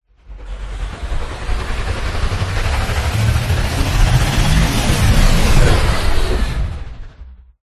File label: Sci-Fi Power Up; Ryan Van Eerde